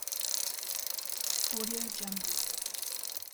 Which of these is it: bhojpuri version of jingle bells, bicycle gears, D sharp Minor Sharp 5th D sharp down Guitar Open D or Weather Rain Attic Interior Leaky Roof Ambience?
bicycle gears